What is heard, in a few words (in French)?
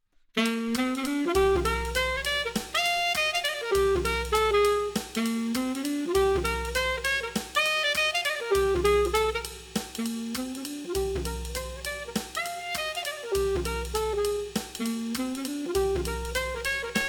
Sax et Bop